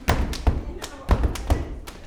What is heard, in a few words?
HOW IT IS, SLAP STOMP; Spectrasonics; Vocal Planet; Blues Soul